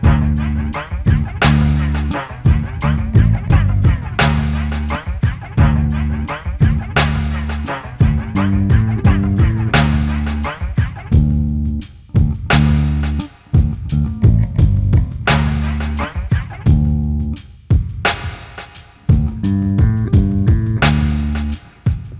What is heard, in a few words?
dub; Digital Culture Stream - Volume 4A; triphop; Faze